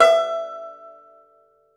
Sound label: Tenor Banjo, Plucked Strings